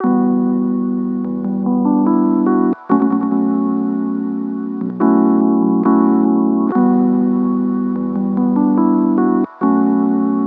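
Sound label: Piano, 143BPM, Gb minor, Electric, Soft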